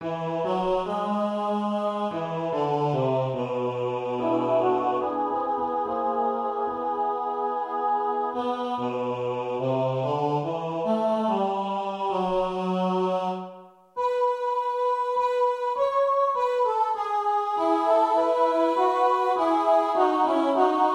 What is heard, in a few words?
Dominus vobiscum Alto